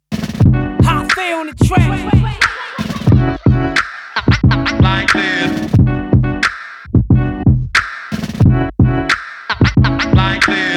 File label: beat cafe drumkit; hazhe